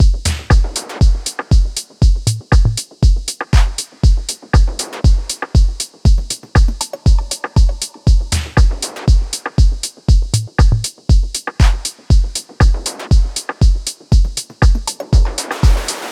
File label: Ethereal Techno Intro